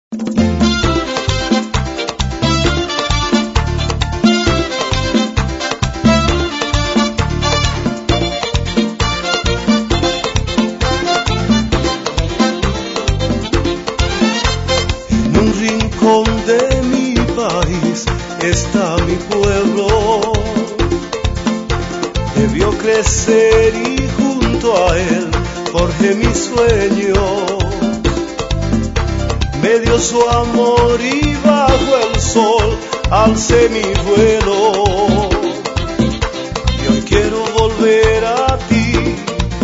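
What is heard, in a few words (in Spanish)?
merengue, La música tiene 4 tiempos pero se baila en 2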